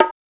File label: BONGO